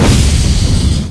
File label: discharge; hl2; combot